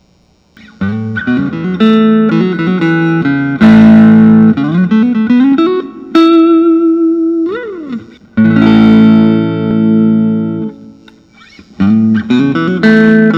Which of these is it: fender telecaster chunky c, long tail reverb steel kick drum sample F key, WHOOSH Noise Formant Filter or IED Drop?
fender telecaster chunky c